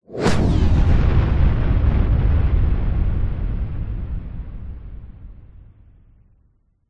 warfare missile bomb incoming whoosh into large explosion